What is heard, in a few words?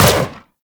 Sentient Symbilyst Laser Fire; Warframe; Weapons